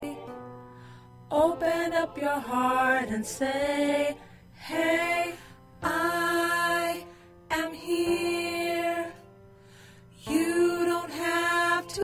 Alto

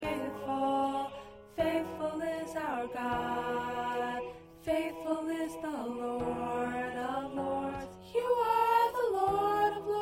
Soprano, choir parts

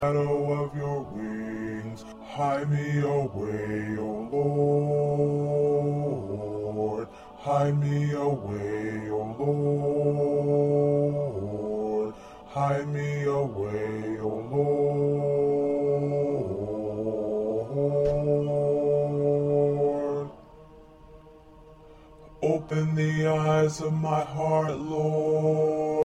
2nd Bass

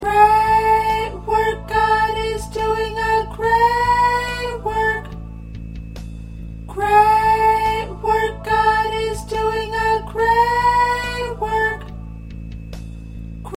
Alto

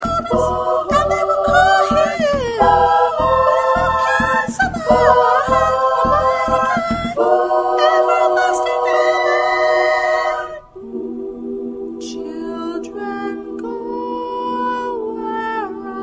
SSA

